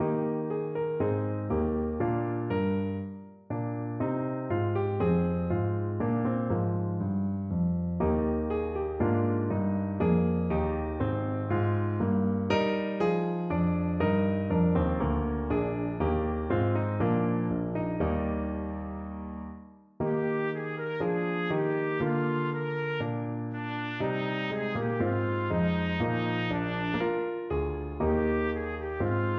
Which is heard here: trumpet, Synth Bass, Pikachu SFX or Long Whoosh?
trumpet